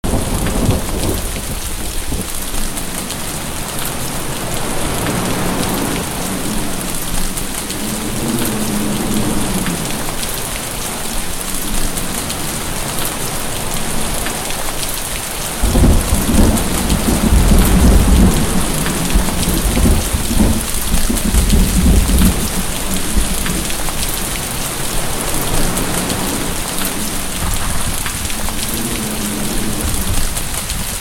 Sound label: Heavy Rain and Thunder; RAIN, THUNDERSTORMS; Sleep Sounds of Nature